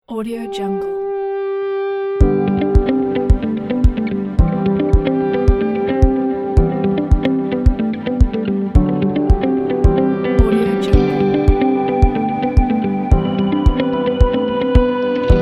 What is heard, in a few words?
corporate business background